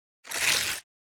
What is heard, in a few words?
Paper